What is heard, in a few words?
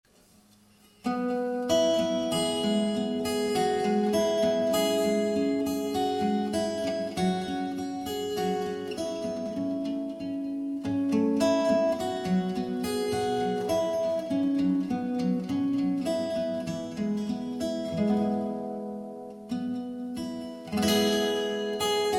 fingerstyle